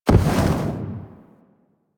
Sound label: Enter Aim Glide; Warframe; Tenno